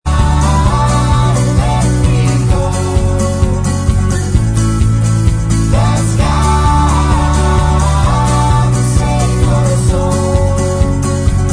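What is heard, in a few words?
backing vocals, backing track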